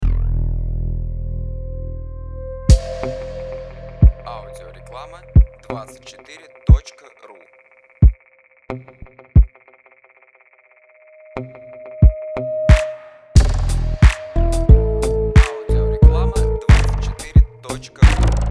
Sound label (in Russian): Джингл; Территория Смыслов; dzhingl dlya zastavki